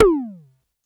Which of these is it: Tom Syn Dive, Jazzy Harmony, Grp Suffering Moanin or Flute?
Tom Syn Dive